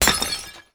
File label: Smash Pot; Game Essentials